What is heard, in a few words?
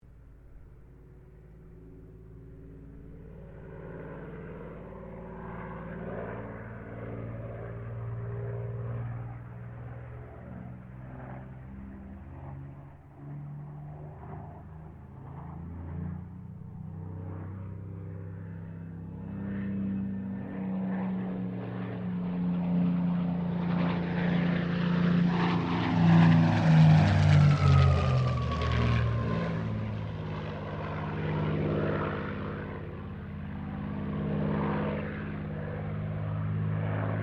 AIRCRAFT SUPERMARINE SPITFIRE MK-XVI PASS; Airplanes